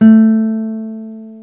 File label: GTR nylon